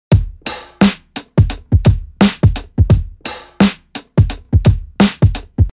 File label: 86 DRUM LOOP LOWKEY BANGER